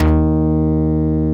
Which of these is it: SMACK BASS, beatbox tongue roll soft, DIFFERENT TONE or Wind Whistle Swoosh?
SMACK BASS